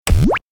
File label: TV switched on television turn on; sitcom